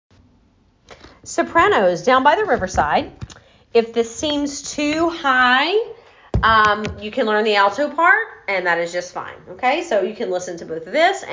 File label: Soprano